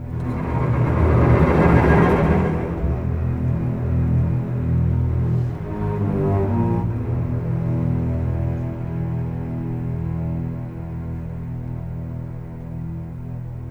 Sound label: Cinematic Strings, Orchestral